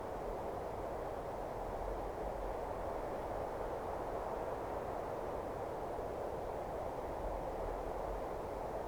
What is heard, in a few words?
ambience - skydive; brainiac ship